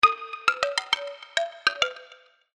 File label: Topple Alarm; HTC; Hollow